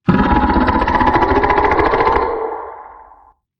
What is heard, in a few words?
Figure Growl; Фигура из Роблокс Doors